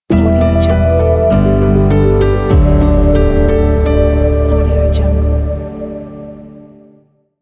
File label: elegant piano logo